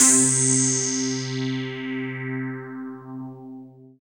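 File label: synthesizer, digital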